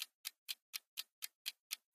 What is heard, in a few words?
tik tok; reddeath